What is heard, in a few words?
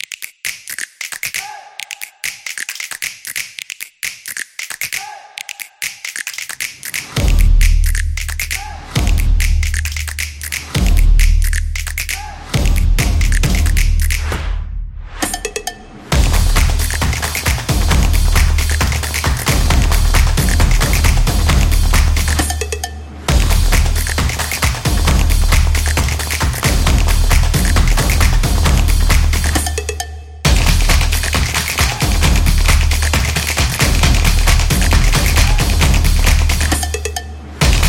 Drum Energetic